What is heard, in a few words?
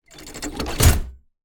gearbox building block large cylinder press; original helmsworth; fvtt sonniss gdc audio